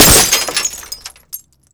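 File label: glass break automobile small; Explosions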